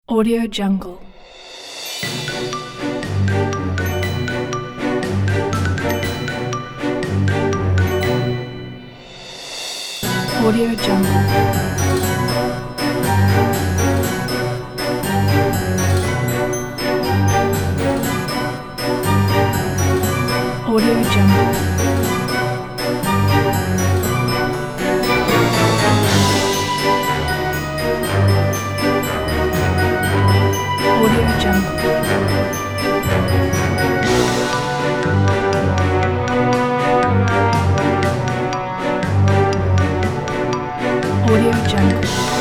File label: halloween